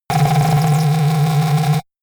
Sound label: shot ringing wobble noise; pulsieren